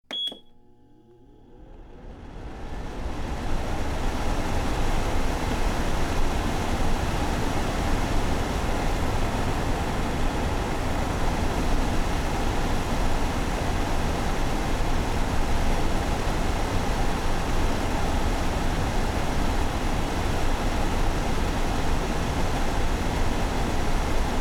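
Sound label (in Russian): звук работы кухонной вытяжки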